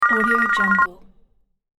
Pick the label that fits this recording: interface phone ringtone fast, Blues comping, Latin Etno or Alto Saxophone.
interface phone ringtone fast